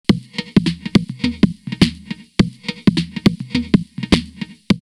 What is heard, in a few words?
808 Nice Empty 104; drumloops; DrumMachines; X-Static Goldmine